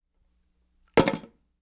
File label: headphones